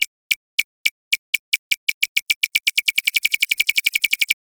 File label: Grenade Countdown; Grineer; Weapons